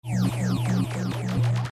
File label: Earth Spirit Stun